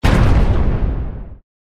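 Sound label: death energy attack; monster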